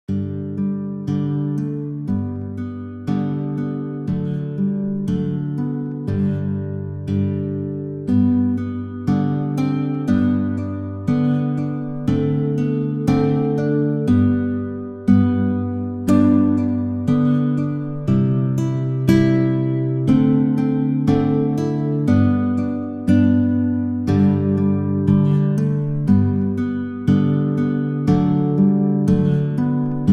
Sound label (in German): einfach 60bpm